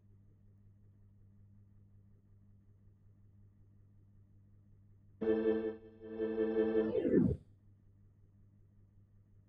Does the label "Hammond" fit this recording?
Yes